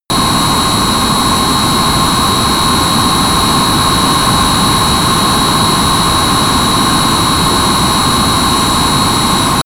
military jet b-1b lancer turbine full power direct; Airplanes - Jets